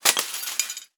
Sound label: Crash Waiter Dropping Tray In Kitchen; Crashes